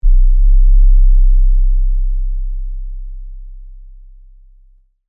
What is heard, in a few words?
Subsonic Pulse LFE, Scifi, Subsonic, Pulse, Lfe, Hit; Science, Fiction; Sci Fi Sound Effects